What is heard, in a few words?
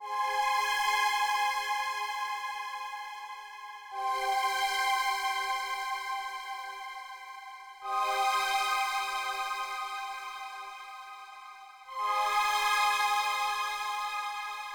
Strings; Vostok Inc.